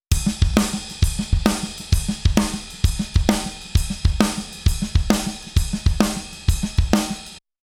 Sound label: Drums Wet